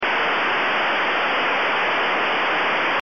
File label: Hissing